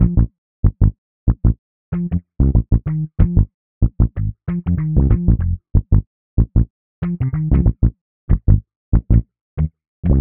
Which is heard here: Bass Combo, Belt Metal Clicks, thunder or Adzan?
Bass Combo